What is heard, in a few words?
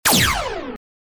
deep laser